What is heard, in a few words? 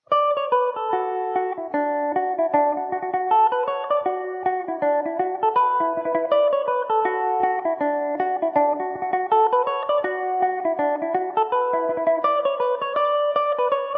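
D major revelation, irish mandolin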